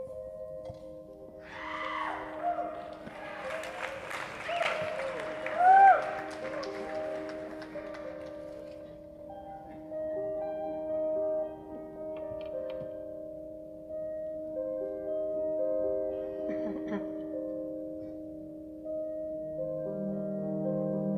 Piano Medley

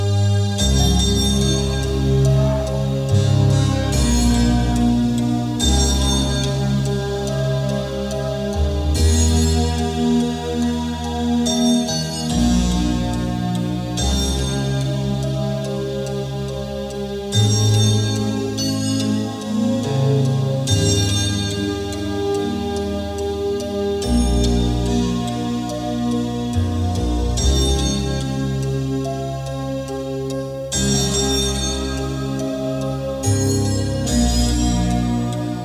instrumental

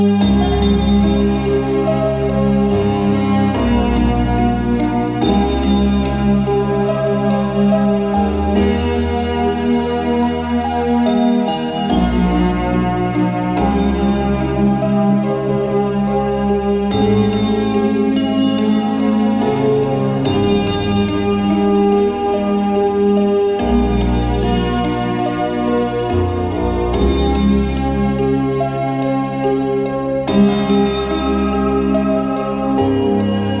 instrumental

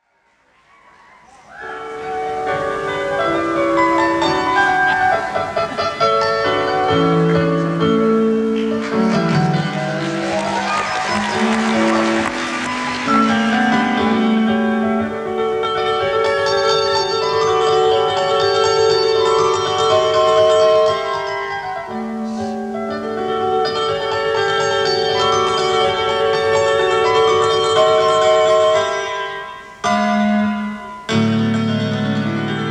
Piano Concerto

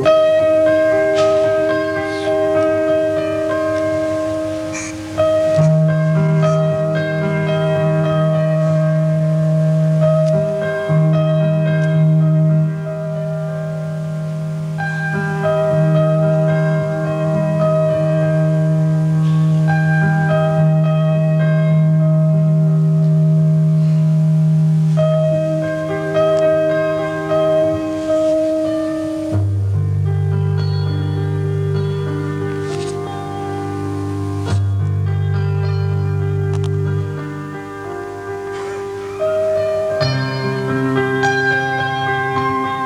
Piano Medley

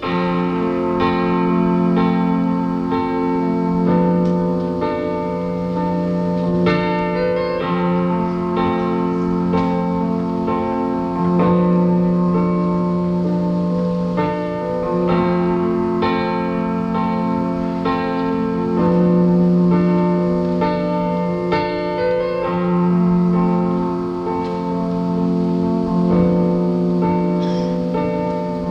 Papyrus, piano

